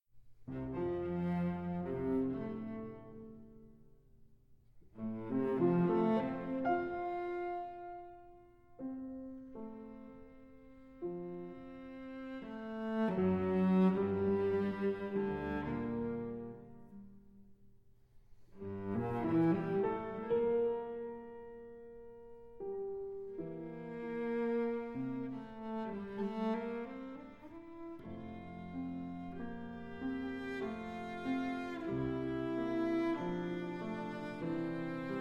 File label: Sonata in F Major, Adagio Sostenuto - Allegro